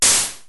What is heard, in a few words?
DF-500 HiHat, Drumfire